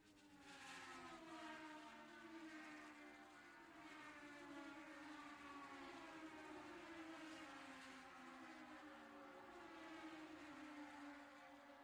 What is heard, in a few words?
f1 sound